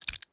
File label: rattle, grenade; props